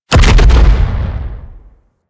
Step Metal; Warframe; Teralyst; Ropalolyst; Steps

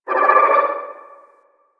Calm Loop End; Warframe; Anima; Anima Animus

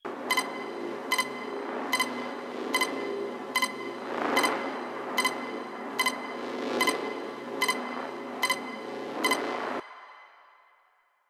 Cave Bedroom Hospital Device Loop; Ambience; Warframe; Stalker Cave

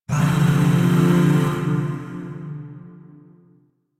Shawzin Min Chord D; Music; Chords; Warframe; Zariman Void Shawzin; DE

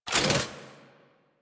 Mech Move Foley; Nechro

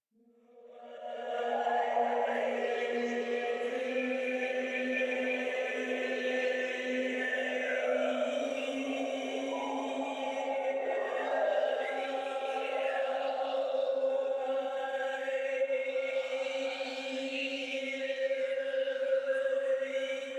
Choir; Warframe; DE; Sentients

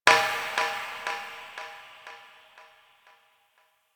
Ship Metal Knock; Ambience; Warframe; Corpus Ship Remaster

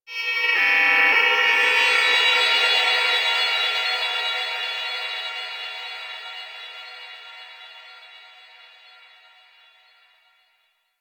Texture Distant, Ambience; Warframe; Cephalon Weave; DE

